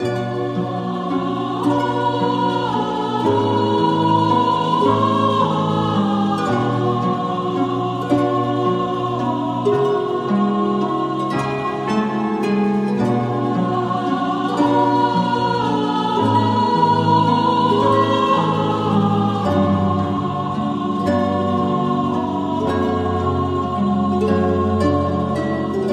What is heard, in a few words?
voice distant wails; Loops; Sad